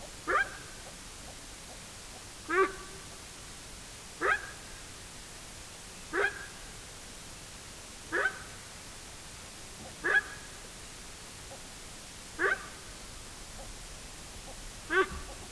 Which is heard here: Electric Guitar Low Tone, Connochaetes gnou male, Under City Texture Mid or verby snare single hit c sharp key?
Connochaetes gnou male